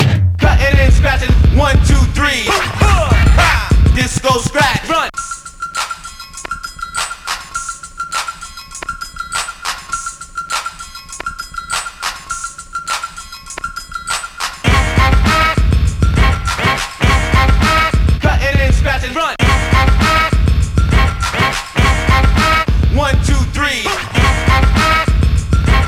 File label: breaks; Super Duck Breaks